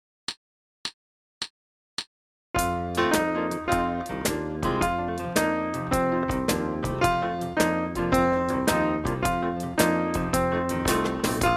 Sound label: blues in f, 106 bpm